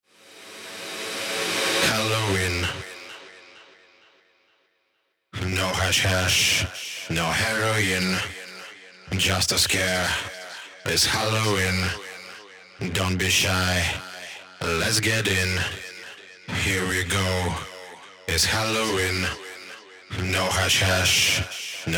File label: Halloween Acapella